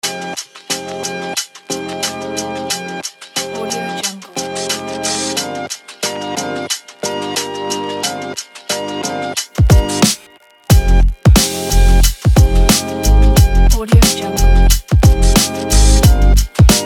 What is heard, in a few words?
the beat